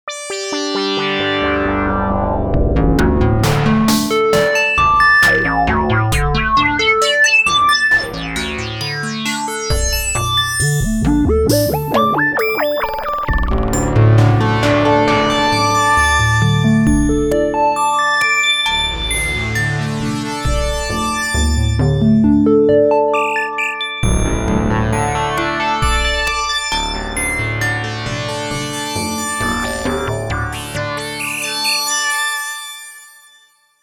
Synth

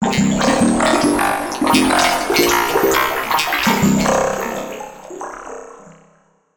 SPLAT MUS; eShop

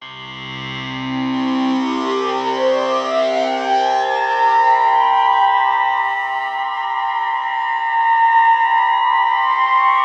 pad

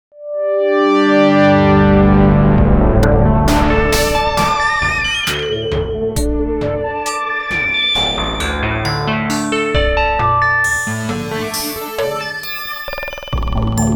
Pads

